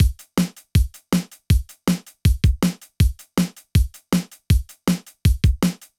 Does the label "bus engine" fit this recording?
No